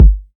808's

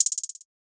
Sprinkler Perc; Percs

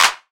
Clap

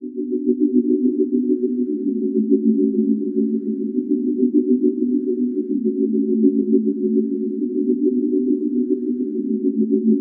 melody loop 130 Bm

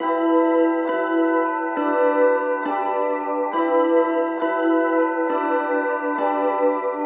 Melody Loop - 136 BPM F Min; Cymatics; Astro Seventeen

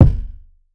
Rattle Kick

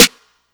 Snares

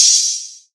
OPEN HAT

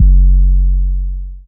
F# 808

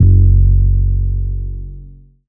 808 - F#; Cymatics

